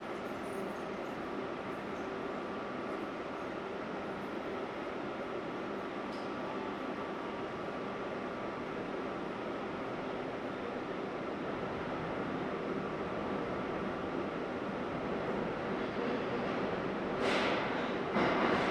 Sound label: INT NYC Subway Train Empty Ride Idle in Station; Vehicles; AMBISONICS